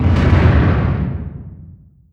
distance light cruiser attack; Hearts of Iron IV Death or Dishonor

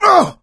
hurt major, survivor voice; Left 4 Dead 2; coach

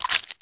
leech attack; Emperor - Battle for Dune

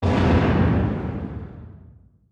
distance airplane bomb; Hearts of Iron IV Death or Dishonor

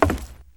wood panel, footsteps; Counter-Strike Global Offensive

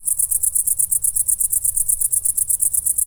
cicada short; Left 4 Dead 2; random amb sounds

